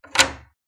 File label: Door key lock; Phasmophobia